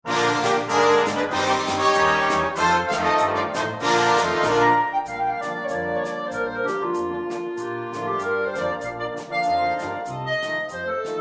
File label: Blues